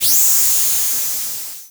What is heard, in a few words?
Title Transition Laser Long; Production Element